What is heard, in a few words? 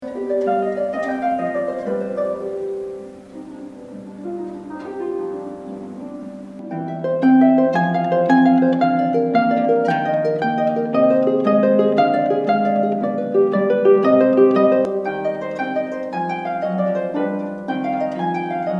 Harp